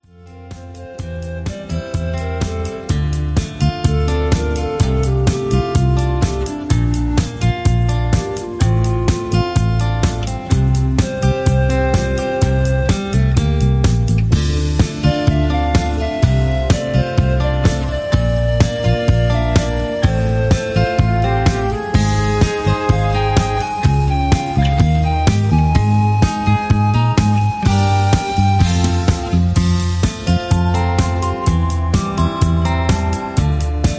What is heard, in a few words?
Instrumental Leads